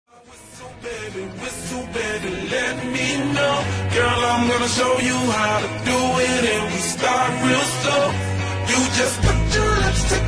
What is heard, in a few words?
whistle